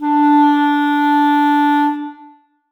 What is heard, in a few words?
clarinet d4; Sonatina Symphonic Orchestra